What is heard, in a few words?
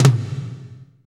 TOM, Drumscapes Roland